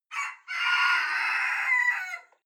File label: cock call hoarse distant